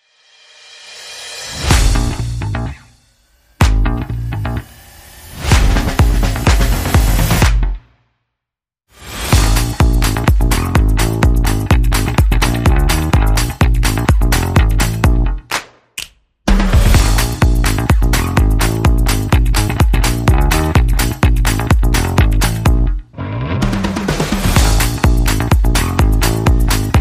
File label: Funky Fresh, No Horns